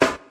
Clear Steel Snare Drum F# Key, snare one shot tuned to the F# note